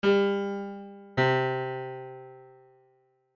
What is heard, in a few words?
song